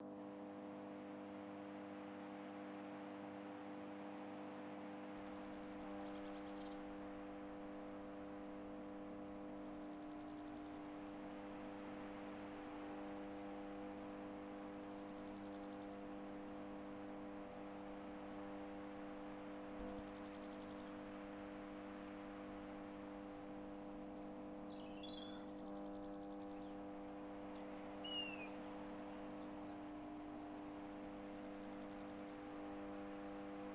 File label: truck ambience; seeding-planets